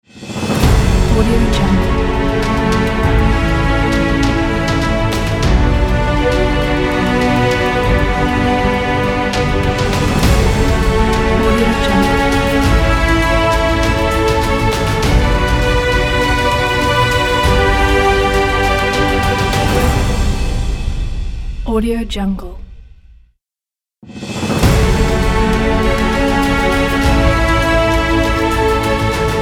epic logo

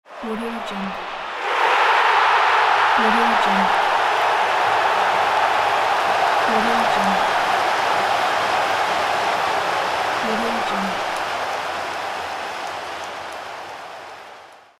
soccer goal; victorysound

